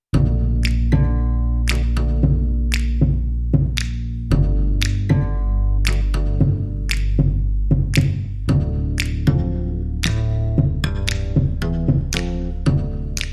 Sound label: No Cello